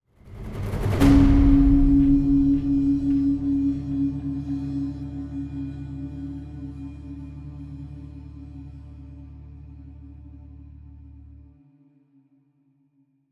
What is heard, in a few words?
Home Sad Stop 6-4 60bpm; project zomboid; Echoes From Before